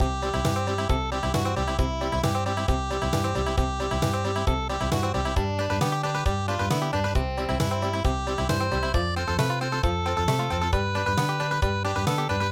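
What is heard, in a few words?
unplugged Country; midi; traditional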